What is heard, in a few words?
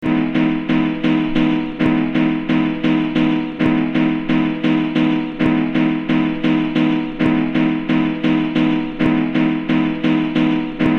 Stab Loop; vinyl only locked grooves